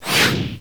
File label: riding spear